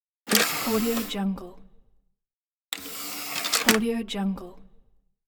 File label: cd tray open and close; moonaudioproduction